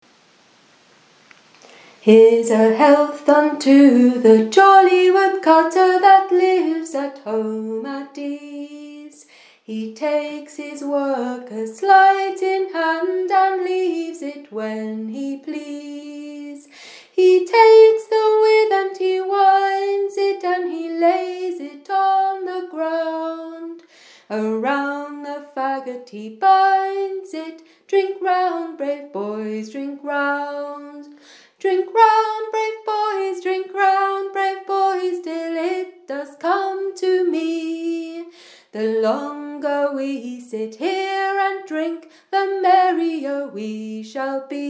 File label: supper harvest song recording; The Woodcutter